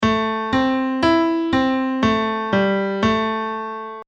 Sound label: Minor Scale Piano